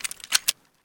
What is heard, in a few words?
magnum clip in; weapons; 44magnum; garrysmod